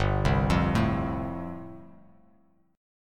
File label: G 7th Suspended 2nd Suspended 4th, Bass Standard